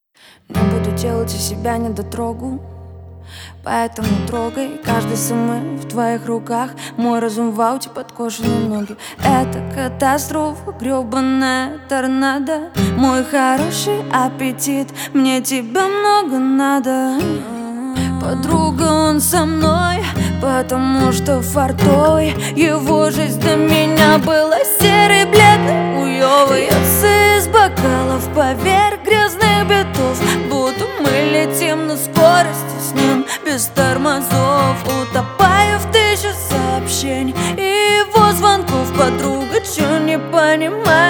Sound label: acoustic live